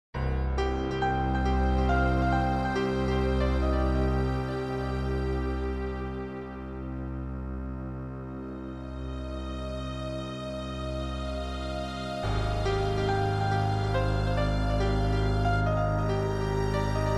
slime

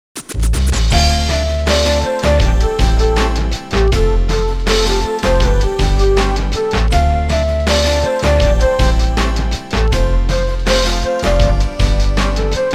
Loops

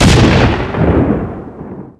explo; Pacificwar

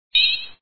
confirm

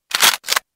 pickup

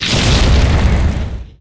fire

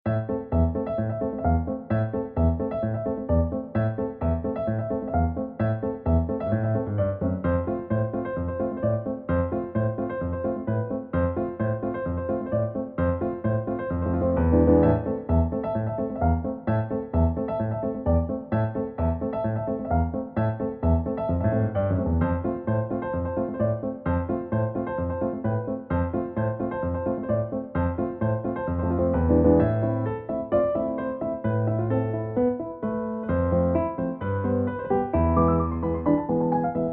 bgm

